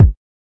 kick

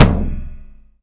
kick loose; Kicks; single hits

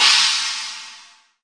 linn CHINA; DRUM MACHINES

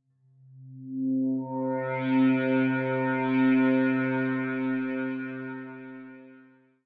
Metal Swell C3, Dark Swelling Synth

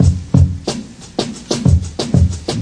soul chicken beat, drum loops and breaks, 091 bpm; 091 to 100 bpm

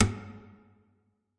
Nylon Hit, Guitar Body and String Hits

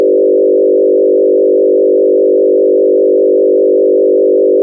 laser treatment, Electro and Synthetic

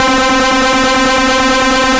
Lead, Synthesizer Middle C Notes

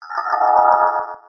scifi; Scars FNC SFX

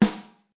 Cold Snare, DrumKit; REAL LIVE KITS; Osama Qureshi DrumKit

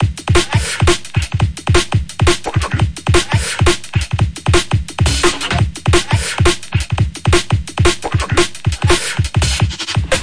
dnb break; 172 bpm; 161 to 180 bpm

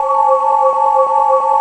Electro FX; Ueb HE Electro FX

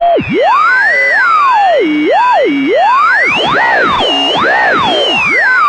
tune yer shortwave; Electro and Synthetic

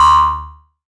8 bit kit bounce; LO FI and 8 BIT KITS; 8 Bit Videogame Kit

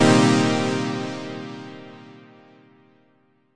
stab satisfying

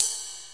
electro ride

